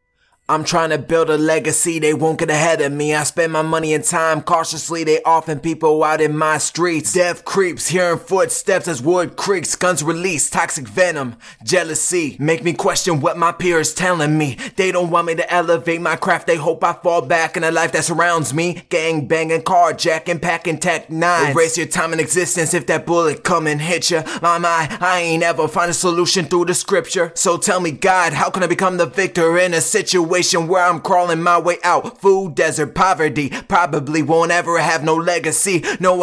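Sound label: hip hop 79 Bm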